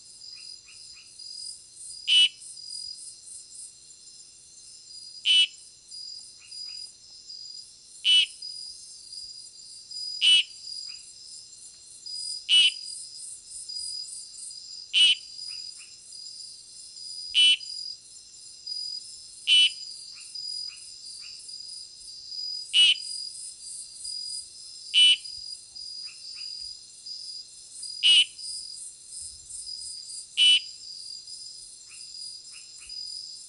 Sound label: Oreophryne philosylleptoris